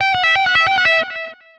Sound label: Pickup Guitar Lead Riff; Pickups